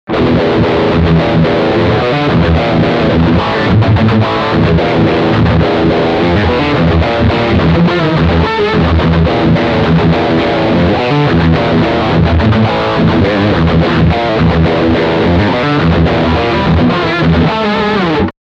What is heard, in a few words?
rear humbucker core riff